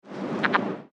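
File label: Rana aurora release, frogs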